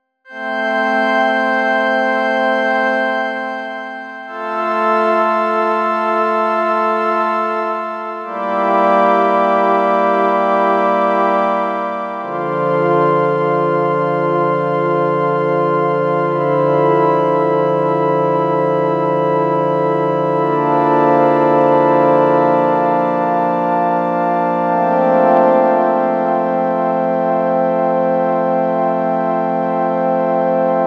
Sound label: F120 Impro chords1